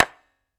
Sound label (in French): raquette; Ultranium 3D; Jade